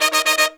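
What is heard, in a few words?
Pop & Funk Brass, 110 Eb, left; Best Service ProSamples vol.25; AKAI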